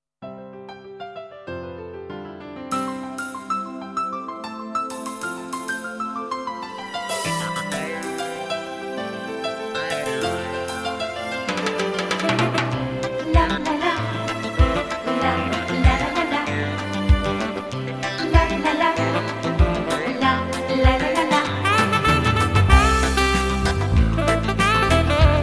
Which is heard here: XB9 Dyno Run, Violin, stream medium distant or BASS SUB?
Violin